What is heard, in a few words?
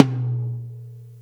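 TOM soft; Zero-G; Chemical Beats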